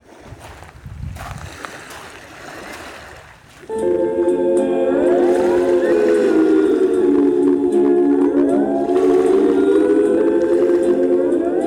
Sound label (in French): carte postale sonore freeson marin